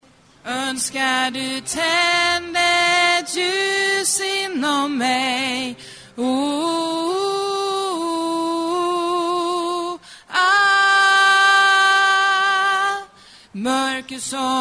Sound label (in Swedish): bas